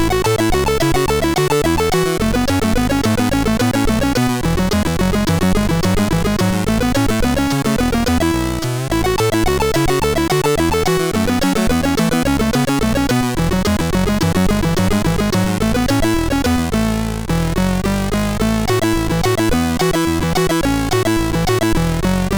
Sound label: sid MOS6581R3; Heavenbound